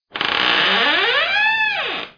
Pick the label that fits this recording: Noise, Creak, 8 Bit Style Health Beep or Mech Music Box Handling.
Creak